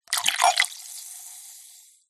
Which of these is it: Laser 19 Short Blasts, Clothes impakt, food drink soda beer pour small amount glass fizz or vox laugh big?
food drink soda beer pour small amount glass fizz